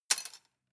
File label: cutlery plastic drawer place hard